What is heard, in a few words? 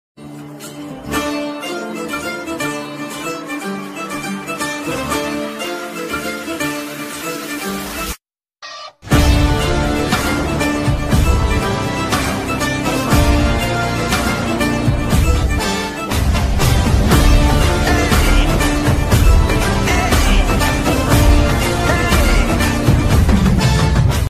bgm ringtone villain beats; moonu x mahaan